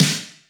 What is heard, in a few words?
Squid Beatz - Snare; Splatoon 2